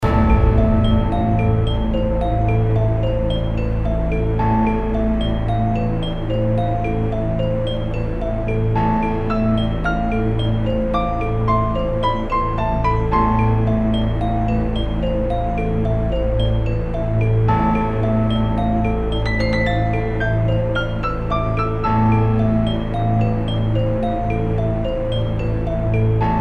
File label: mystery 110 BPM loop, adventure game music; アドベンチャーゲームやノベルゲーム; Adventure Game Music Pack